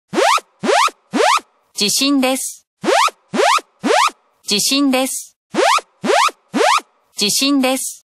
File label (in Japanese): 緊急地震速報